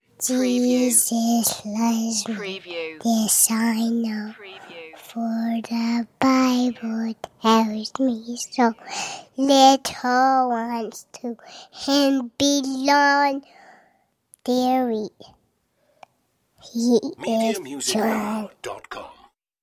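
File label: Kid Sings Jesus Loves Me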